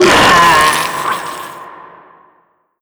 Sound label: death, zombie vox; creatures